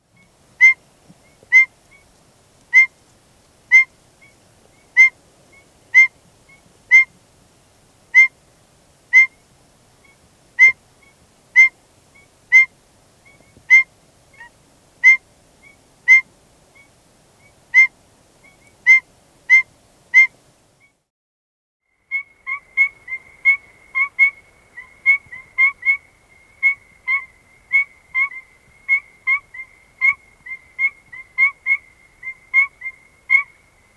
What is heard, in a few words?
Aplastodiscus Perviridis